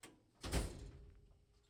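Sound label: m5 High Speed Tractor onboard door close roof; DPA4061; Pole Position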